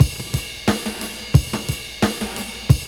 LOOP, DRUM; E-Lab; X Static Goldmine 2

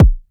PERC; ZERO-G; Datafile

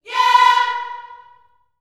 "yeah", gospel vocal, B4; Spectrasonics; Vocal Planet Vol.1 Gospel; akai

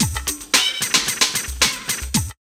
LOOP, DRUM; E-Lab; X Static Goldmine 2; akai

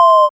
SYN TON

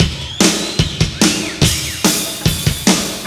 BREAK

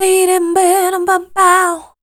RHY LINE, Voice Spectral; RHYTM

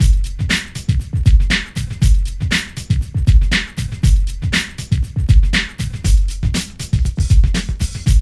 PHAT LOOP; E-Lab; X Static Goldmine 2; akai

